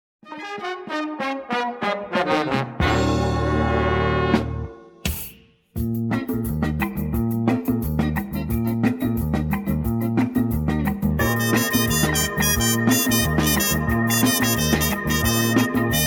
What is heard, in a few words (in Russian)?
instrumentalnyiy ansambl